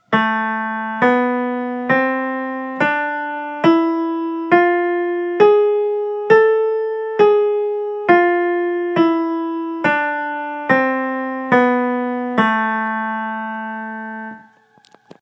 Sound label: harmonic minor